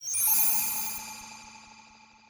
warp; minetest; teleport-request

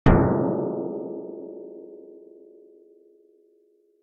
cannons dig; minetest

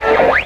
ham radio tuning; minetest

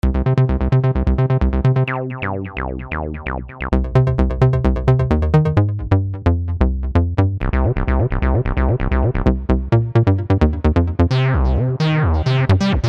beat; GeoJam2021

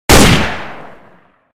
ak; minetest

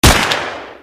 makarov; minetest

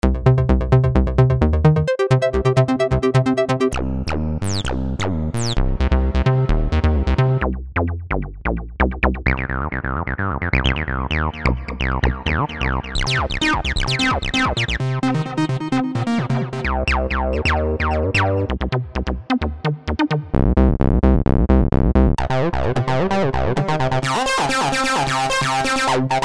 beat; GeoJam2021

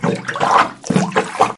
sploosh; minetest; digtron

